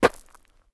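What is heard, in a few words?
gravel, steps; Terrarum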